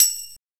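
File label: percussion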